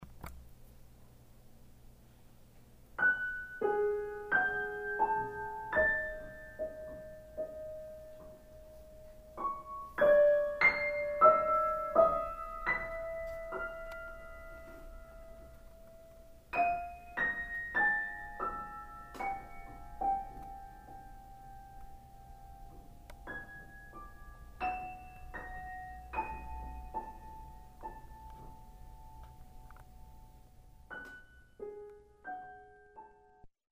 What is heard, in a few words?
Twinkle Twinkle fade